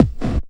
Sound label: hardcore 1, bassdrum